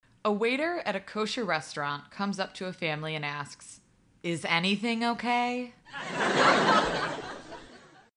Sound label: Laugh Track; Joke